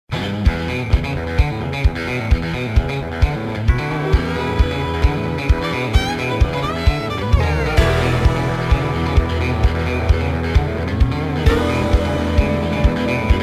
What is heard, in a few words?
honkytonk